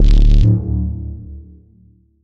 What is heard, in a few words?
rad pulse